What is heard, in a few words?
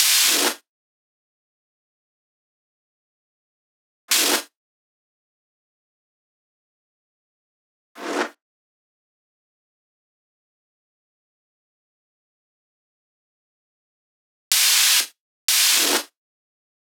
FX WN 124